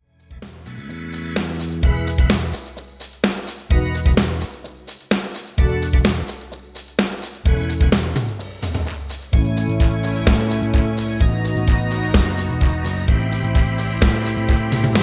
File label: rhythm